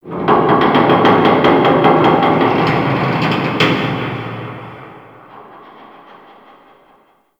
Shipyards Distant Metal Groan, Ambience; Warframe; Grineer Shipyards

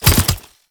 Brood Cast Stop; Warframe; Oraxia

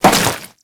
Crewman Step Flesh, Footsteps; Warframe; Corpus

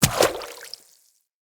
Infested Medium Run Water, Footsteps

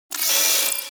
Railjack Turret Harness Movement One Off A; Warframe; Vehicles

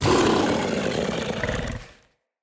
Kubrow Feral Vocal Angry

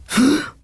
Injured Grunt

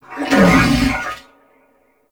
Wolf Pain; Animals; Duviri

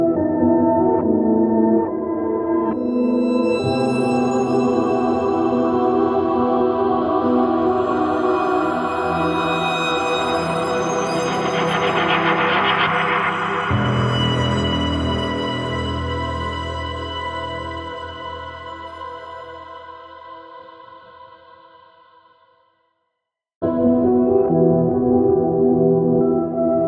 Quest Outro; Warframe; Cinematics; Zariman

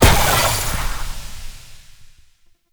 Shock Globe Explosion; Warframe; Eximus

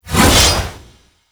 Hammer Glass Whoosh

